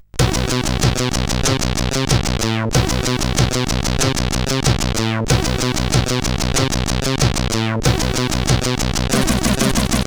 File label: Techno, sid, CSG8580R5